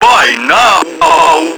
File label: Tentacle Swing, Proto Infested Ancient; Enemies; Abilities; Warframe